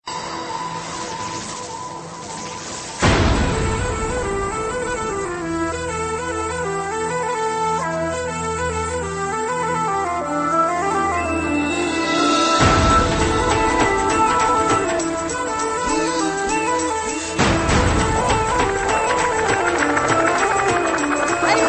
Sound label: Instrumental BGM Ringtone; Dhoom2